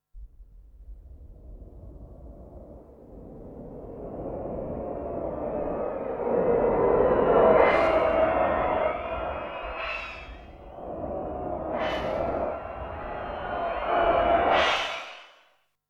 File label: hiss and whoosh